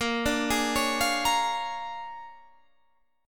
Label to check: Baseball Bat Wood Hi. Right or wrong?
Wrong